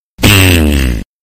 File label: fart